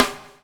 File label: BUBL, BUBBLE; Spectrasonics - Backbeat